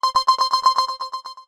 notification tone; asus

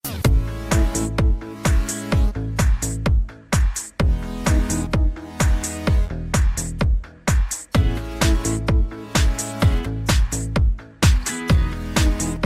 samsung galaxy note 3 ringtone

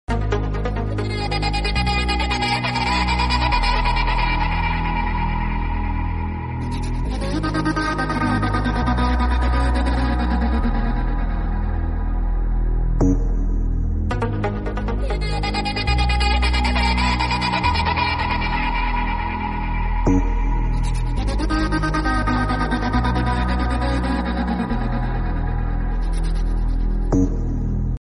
party theme music tone ringtone; colorful; english ringtones

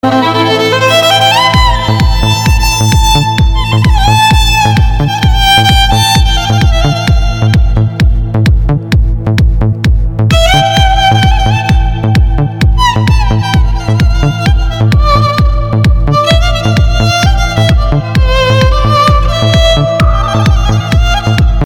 danger sound ringtone

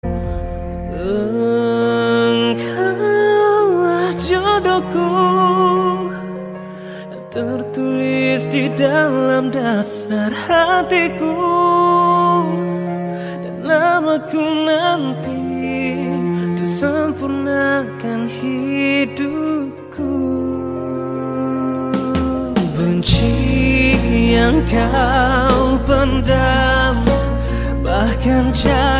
romantic love song tone ringtone; samsung

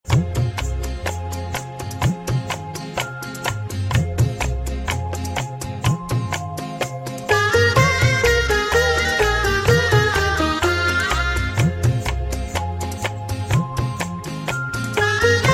shehnai ringtone, bollywood

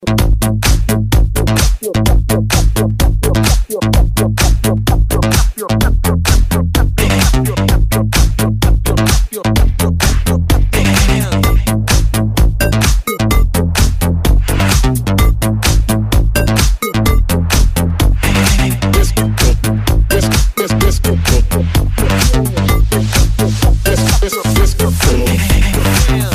elektro ringtone

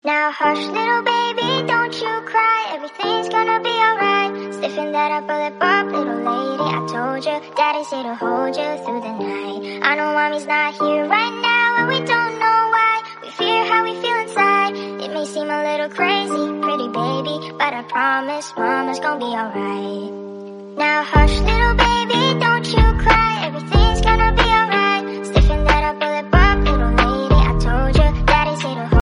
kids song tone ringtone

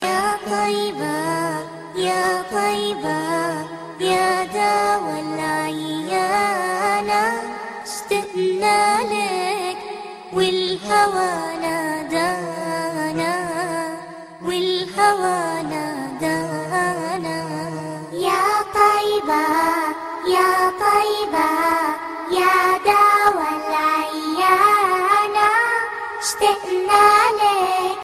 ringtone

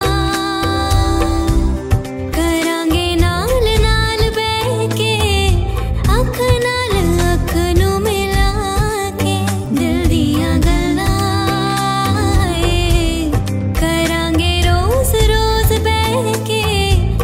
female ringtone, bollywood

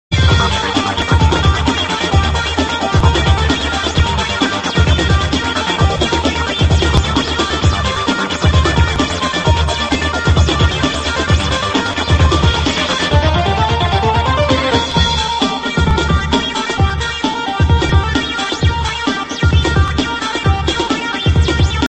techno remix ringtone

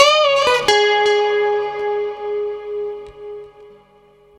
chilling guitar string soft music tone

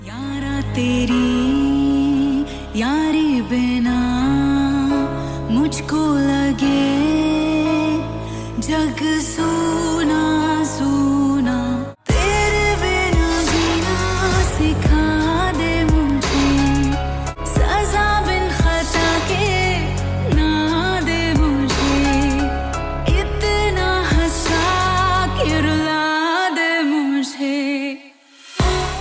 new status romantic ringtone, bollywood ringtones

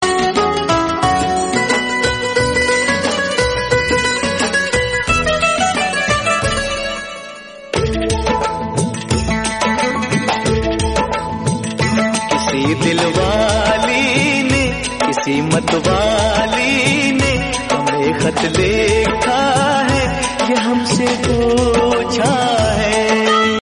instrumental ringtone, bollywood ringtones; border

